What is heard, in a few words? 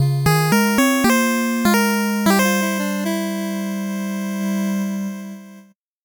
8bit jingle normal